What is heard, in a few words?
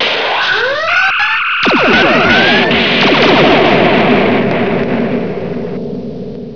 phaser